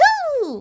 punch hoo; toadette; sm64coopdx; char-select-extra-chars

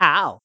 ooof; Luigi; sm64coopdx

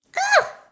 ooof; daisy; sm64coopdx; char-select-extra-chars

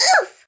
ooof; birdo; sm64coopdx; char-select-extra-chars

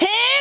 hrmm; Toad; sm64coopdx

